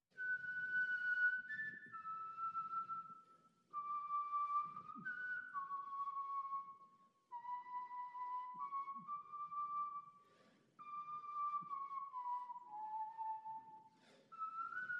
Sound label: Trumpets Clean